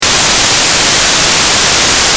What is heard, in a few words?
white clipping